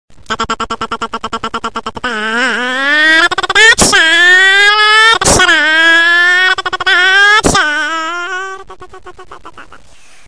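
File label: Turbo Imitation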